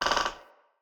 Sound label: Tree Pine Branch Creak; Trees; Midrath Island; Ambience